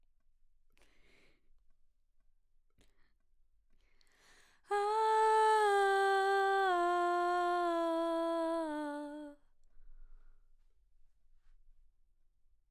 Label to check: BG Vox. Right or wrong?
Right